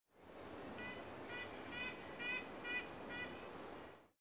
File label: nuthatch; birds